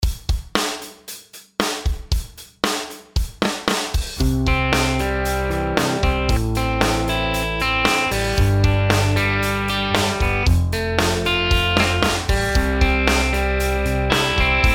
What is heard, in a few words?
chord progs maj root